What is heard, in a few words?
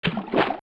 Water Splash; Zelda; LTTP